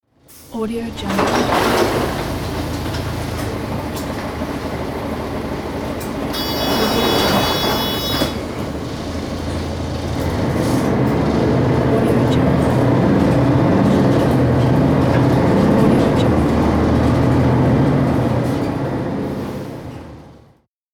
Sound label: street ambiance with horn sound